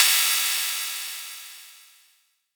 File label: crash